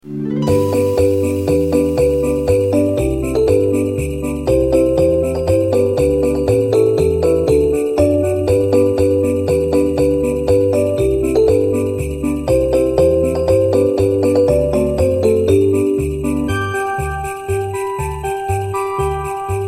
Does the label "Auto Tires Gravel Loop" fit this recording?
No